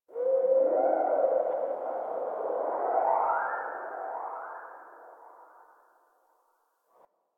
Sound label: Covered Vine Area Low Chirp; Ambience; Misc Outdoor; DE; Warframe; Infested Eris